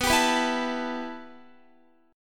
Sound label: B 7th Suspended 4th Sharp 5th, Guitar; B7sus4#5; strummed